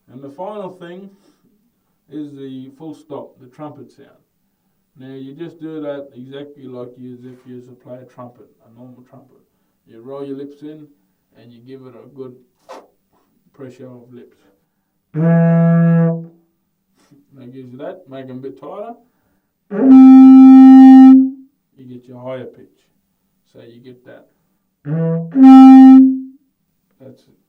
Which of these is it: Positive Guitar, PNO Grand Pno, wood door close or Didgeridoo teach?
Didgeridoo teach